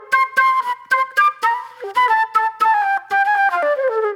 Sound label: Flute, Disco